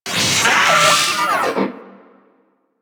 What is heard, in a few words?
Vocal Death; Warframe; Amalgam Moa